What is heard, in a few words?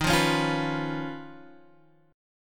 D sharp 7th Suspended 2nd Sharp 5th Guitar Open G